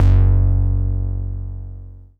bass, Dance Mega Hip Hop Electro; EastWest

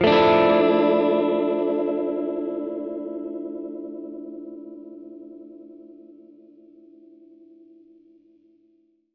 Gretsch Chord Dmin[add9], Guitar Chords

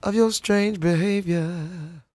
CROON, Vocal Blues & Soul MELLOW

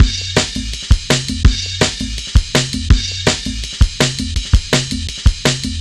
spanish town, Drum Bass Drumloops 165bpm; Zero-G; Total Drum Bass

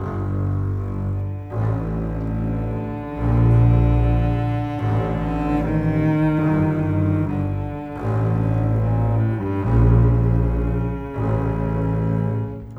Bass Cello, Cinematic

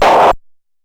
Snare Lo, Stylophone Beatbox

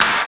PRC CLAP, Clap; Rhythm Section; Clap & Snap; Clap Modules

